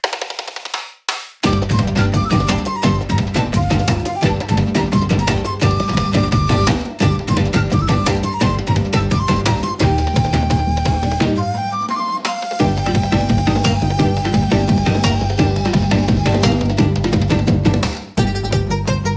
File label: Mini Ninja Groove; Rhythmic Gymnastics Music for Kids & Juniors